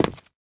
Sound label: footsteps tile